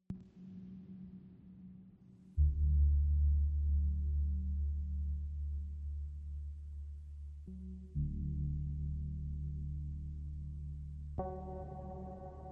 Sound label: ambient